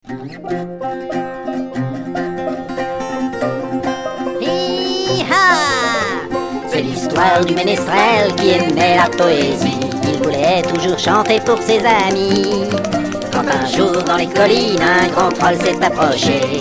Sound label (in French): polka du menestrel; donjon